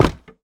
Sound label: hit, armorstand; minecraft 25w18a